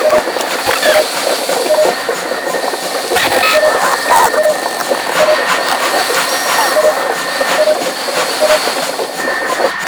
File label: Defense Station Off Loop; Gameplay; Warframe; Nineteeen Ninety Nine; PvPvE